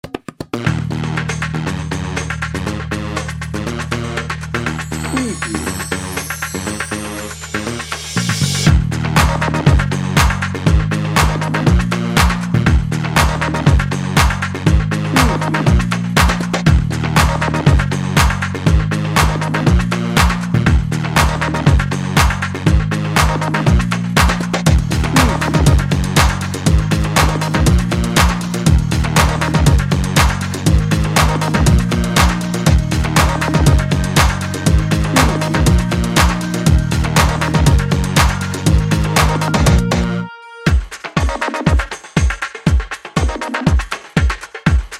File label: slinky beats bed